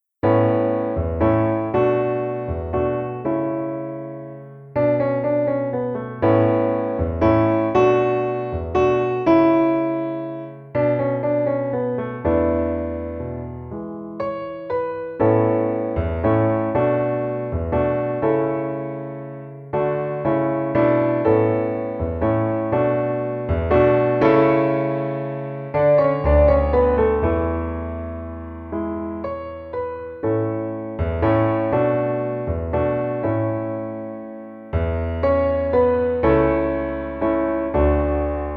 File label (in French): Piano seul